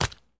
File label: squish